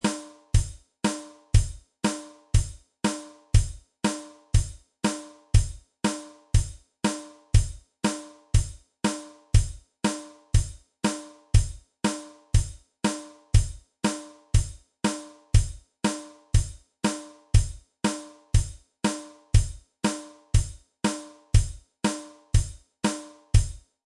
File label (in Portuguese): Baterna Country HC treino; Curso Baterna; Aula 3